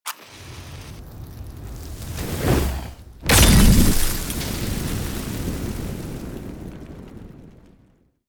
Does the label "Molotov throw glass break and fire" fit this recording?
Yes